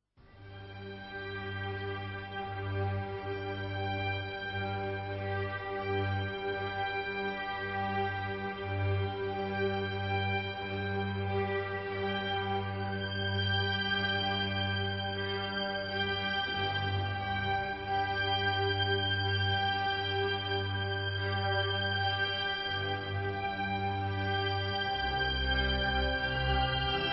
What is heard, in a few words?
For Strings